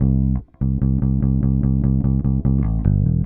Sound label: Bass, Indie Rock; Sonic Foundry (Sony Creative Software); Indie Rock Anthems; Session 11 - Silos